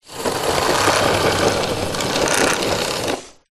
household vacuum cleaner roll wood floor power off; zapsplat